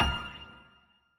piano; osu

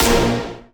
punch A#4

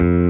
bass

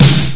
drum hitclap; osu

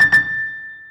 combo break; osu

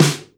drum hit normal

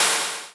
Cym Crash

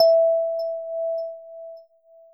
end chime

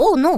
combo break; osu

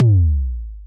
TOM

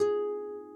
harp

